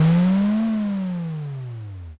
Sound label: dragon